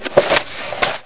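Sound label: book open; The Elder Scrolls III Morrowind GOTY; XBOX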